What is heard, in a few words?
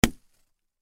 Party Popper Pull, Party Popper Pull String Pop; Party Horn Sound Effects; FOLEY